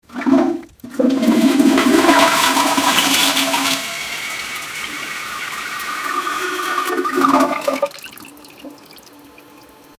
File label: the sound of the toilet, cleaning